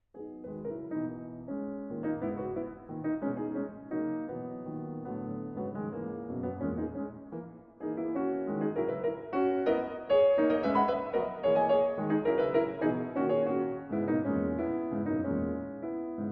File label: Pieces for Piano, Mazurka; Sergey Prokofiev